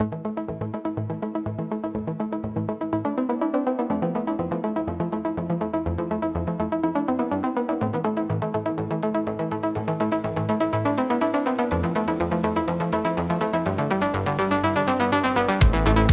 Electronic Impulse

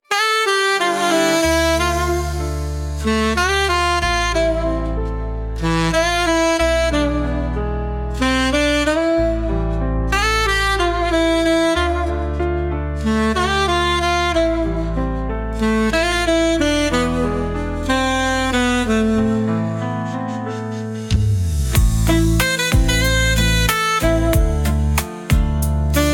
saxophone horizons

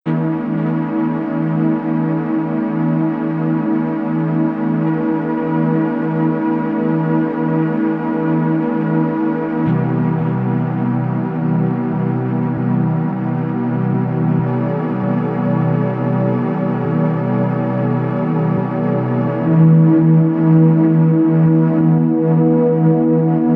magic music ambient 432 hz; magic men

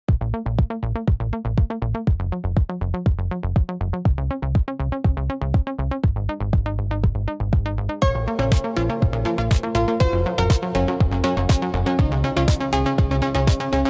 Electronic Impulse

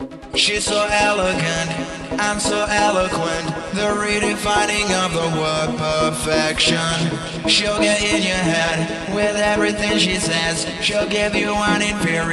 Funky House Vibes

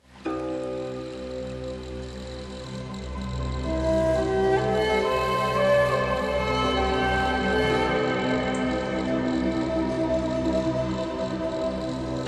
Chinese Meditation

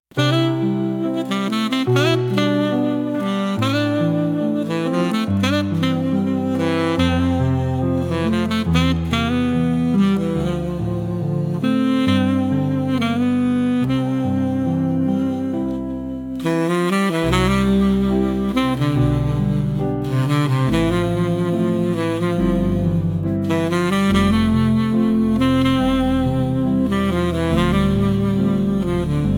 saxophone dreams

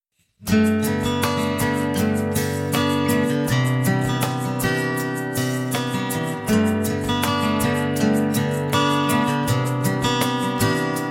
acoustic vibes; 432 hz

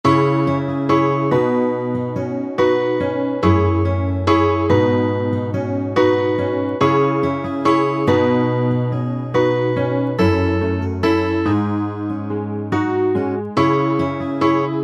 chill beats; Under the Disco Ball

